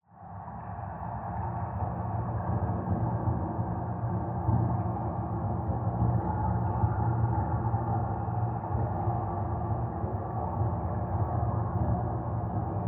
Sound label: Dive Deep Distant, Underwater